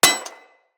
Bullet Impact Generic Metal; Weapons; Surface

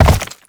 Land Rock; Movement; Warframe; Uriel

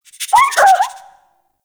Rabbit Ambient Call; Warframe; Duviri

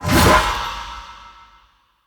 Great Sword Throw Perfect; Weapons; Player; Swords; Mendicant Great Sword

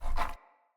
Wood Stool Drag Scrape

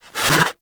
Pet Alert Snort; Warframe; Catbrow; PetShip

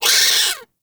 Forest Rodent Vocal Pain; Vocals; Warframe; Characters

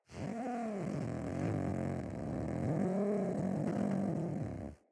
Feral Vocal Idle; Catbrow

